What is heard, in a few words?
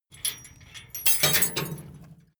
metal tools small drop; soundpool